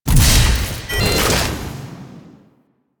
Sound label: Railjack Weapon Heat Sink; Modular Weapons